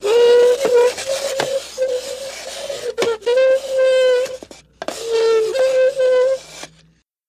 Drag Little Chair, squeak

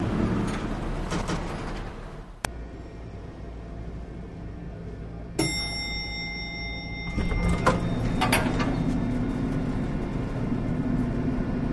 Elevator Door Close

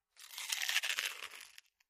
Slower Bite Chips

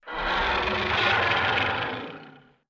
Dinosaur Growls

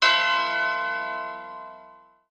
Music Effect Tube Be, chimes

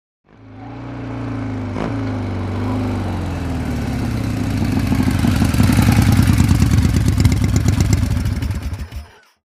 Stop Off Harley Davidson

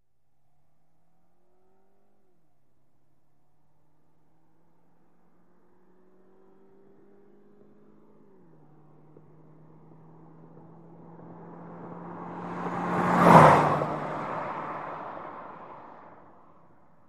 Sedan By Lf Rt 100mph

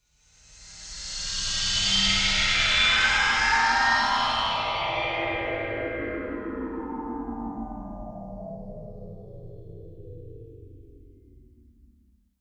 Wash Hissing Drone Tr

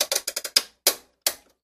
Washing Mach Knob Sw; washing machine

